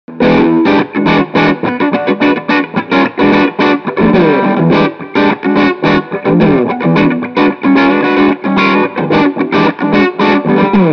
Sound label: E5 Tight Low Fuzz Medium Tone